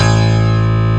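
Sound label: piano attack A1; PIANOATK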